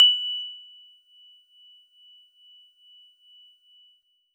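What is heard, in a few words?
Warble Celeste DX100 F#2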